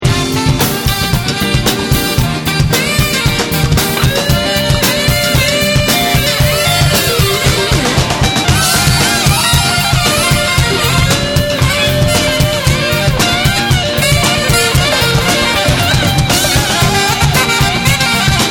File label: Stoliner Horah On Fire